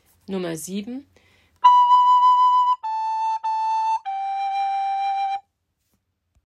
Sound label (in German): Waldhorn